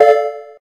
electric, keytone

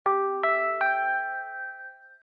Message Tone; LG CX231

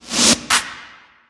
Windup; Sony Ericsson; W995 Alt

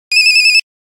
Nokia Buzzer Bee; Windows Phone apps

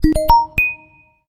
Crystal, notifications; LG G6

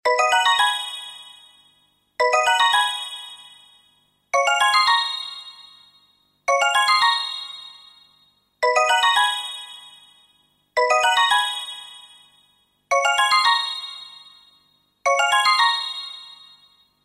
Timer

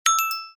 ascending, notifications; HTC One-X

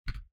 Keypress Spacebar; Huawei Ascend Y

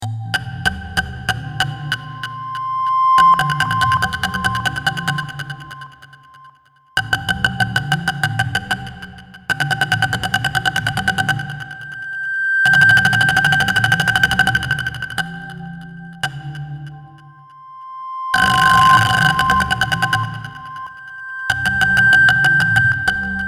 Ringtone

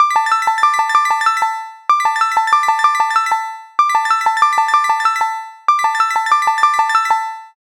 Crystal, alarms; LG Qua tab PZ LGT32

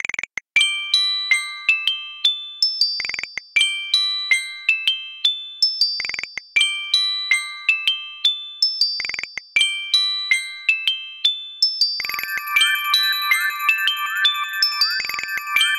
Balloon, alarms; Google Pixel 4; Play It Loud

